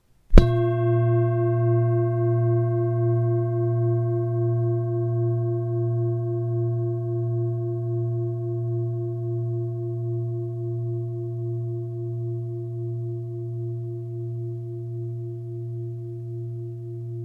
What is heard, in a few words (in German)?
Klangschale